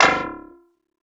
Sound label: Sfx, Trance; Zero-G Trance Inducer; General Sfx